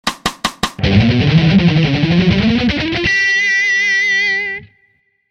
Mixolydian Mayhem Lick; Fast

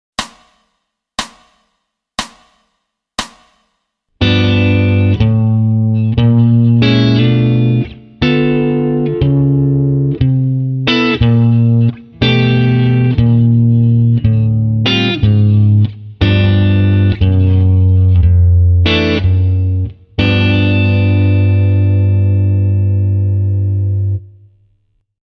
G7 Walk Plus 4ths Voicings slow

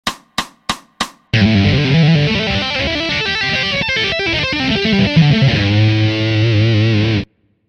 Tapping Lick A Min Pent Fast

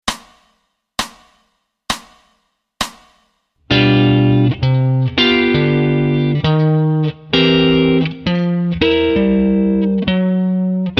Walk Bass With Inv (SLOW)

